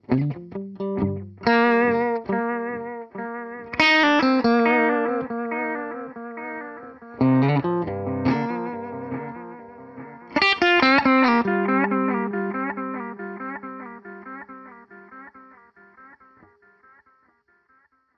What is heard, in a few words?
Delay Long; GFS Effects